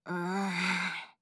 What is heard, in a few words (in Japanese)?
んー…